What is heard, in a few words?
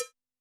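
COWBELL, Yamaha SY85 Drums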